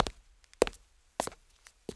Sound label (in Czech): chuze mram; Mafie 1